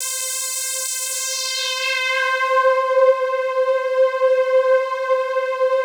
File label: PAD, DIGI-PADS